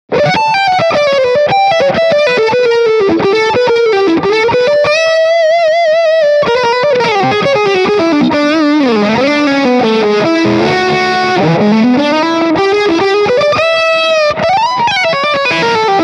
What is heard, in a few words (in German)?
Leadsound